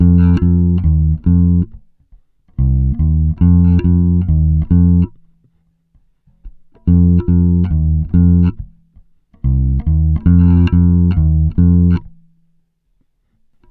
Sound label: PARIS 70, Bass Grooves; USB Soundscan vol.30; AKAI